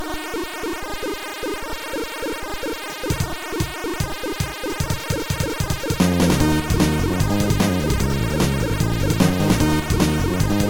swing a bongo

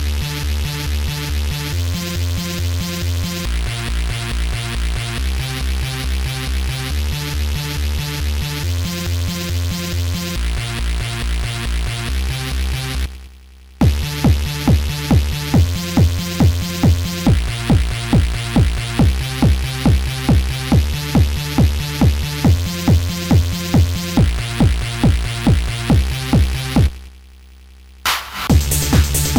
instrumental; Trackers; Modland

